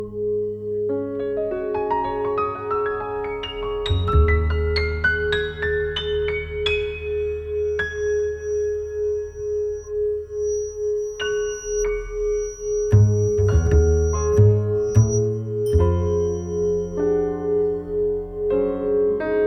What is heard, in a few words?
Singing Bowl